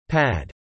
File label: Pad